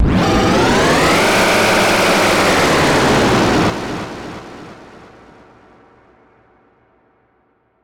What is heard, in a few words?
roaring knight roar